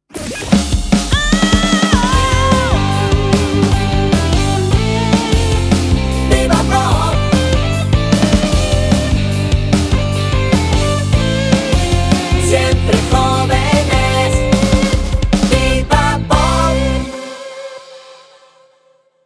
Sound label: Pop